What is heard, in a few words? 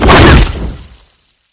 pillow projectile impact; familiar; rem sounds